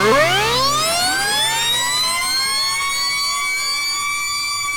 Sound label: Rising Siren, Synth; Keys; Synth Sequences; Drum & Bass